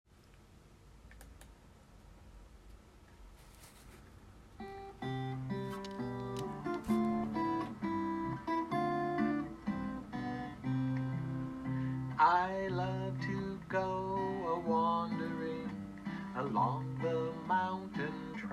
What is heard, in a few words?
vocal